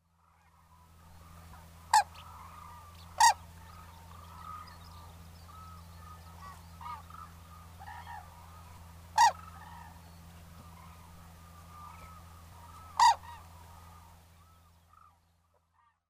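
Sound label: White-naped Crane, izumi; マナヅル; 地鳴き; 鹿児島県出水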